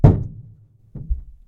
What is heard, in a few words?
roof body impacts; Blind Drive